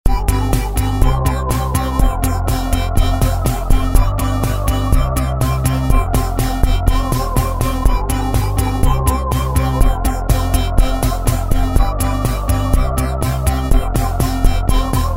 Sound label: Analog Nu Wave; Factory Patches